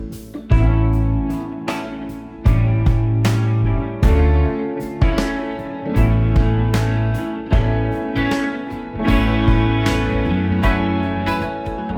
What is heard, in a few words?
loop Funk Groove Guitar in A minor bpm 90